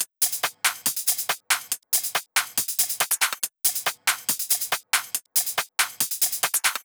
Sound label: drum Loop 140BPM Perc; Vengeance - Essential Clubsounds Vol.5; VEC5 Drumloops; VEC5 Multi Loops 140BPM